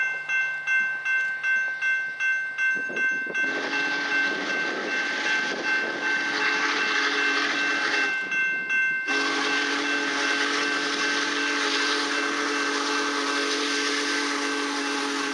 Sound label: whistle